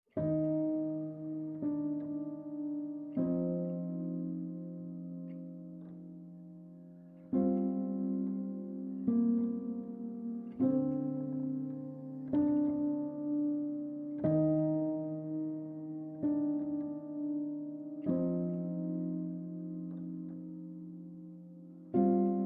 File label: Performed on Piano